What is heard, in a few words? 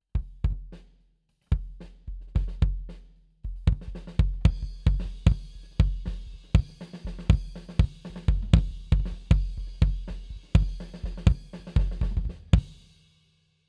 kick head off; D112; far